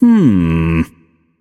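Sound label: thinking, non-verbal; voicepack; smite; merlin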